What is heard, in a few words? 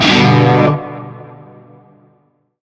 Shawzin Maj Chord; Music; Warframe; Narmer